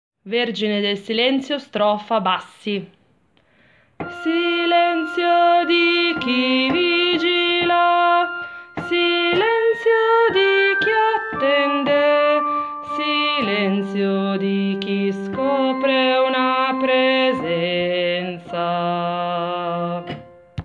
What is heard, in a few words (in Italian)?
strofa bassi